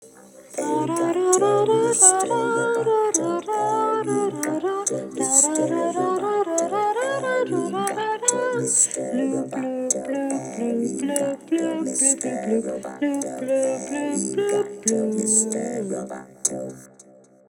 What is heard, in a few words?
Loop Loop